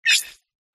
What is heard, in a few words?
Beeps; PMSFX